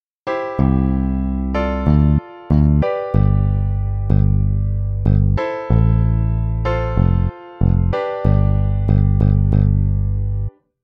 bass chord

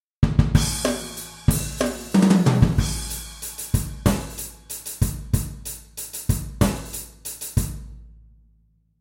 fill in

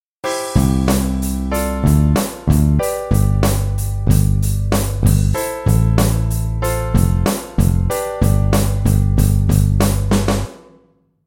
drum bass chord

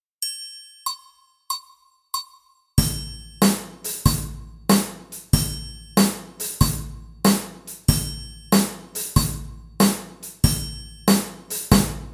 2 beat

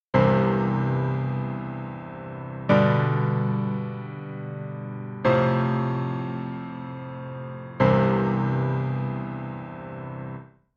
heavy prog

